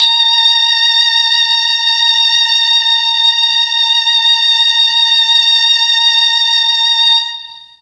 A#3 3 violins, Mellotron MKII; Mike Pinder - Mellotron Archive CD

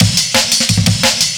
breaks; amen donny

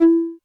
Lo Pipe, Alesis D4 drum machine

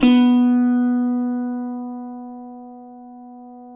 cuatro; Spectrasonics; Hans Zimmer Guitars VOL-2

